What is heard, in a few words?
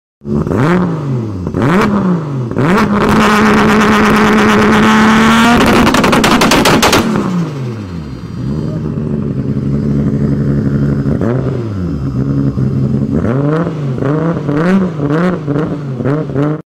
Turbo sound